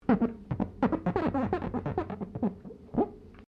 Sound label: GLASS SQUEAK FINGER SEQUENCE